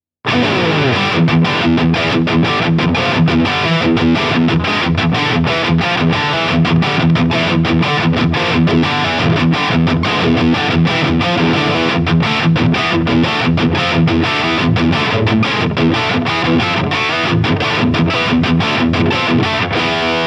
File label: Solar Guitars X1.6 Heavy Lead; Canibalismo